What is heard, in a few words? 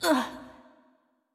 Hurt; Jackie